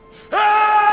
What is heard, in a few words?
sample, male laugh